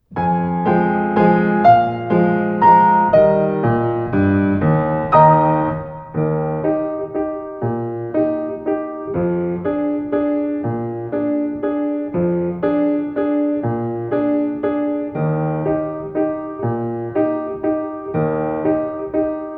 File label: waltz accomp